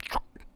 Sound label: choik